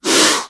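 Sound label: attack; sinfar haks; sf effects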